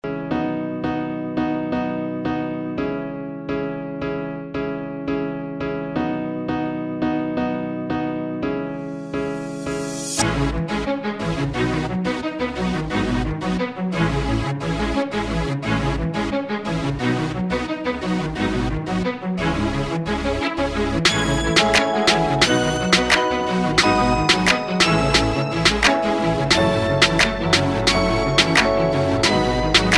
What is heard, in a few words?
HIP HOP Mashup Remix